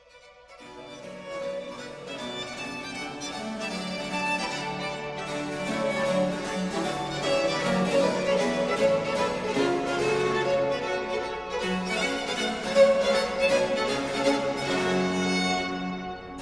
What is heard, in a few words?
gigue; Biber